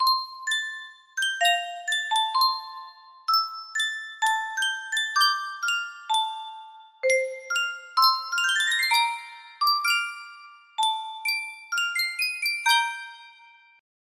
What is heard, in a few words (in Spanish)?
caja de musica, music box; sankyo